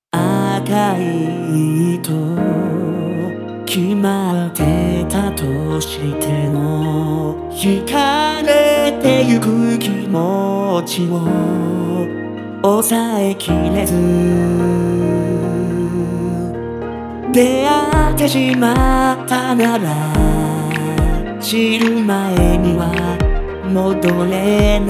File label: Vocaloid